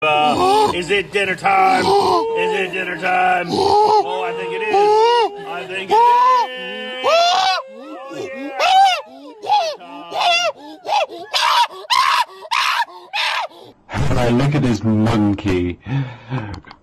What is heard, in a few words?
monkey memes